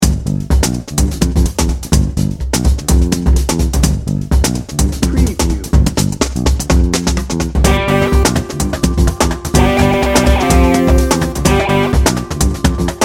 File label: hectic hip hop no horns